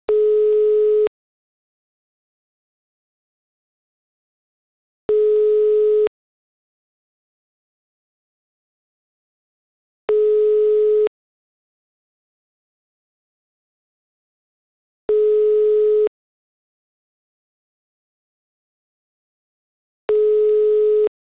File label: Waiting Tone